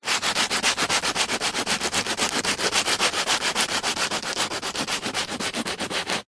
industrial sanding large piece of wood sand paper fast